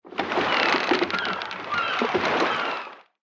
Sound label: fish wharf